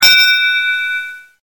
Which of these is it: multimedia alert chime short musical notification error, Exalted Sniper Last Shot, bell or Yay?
bell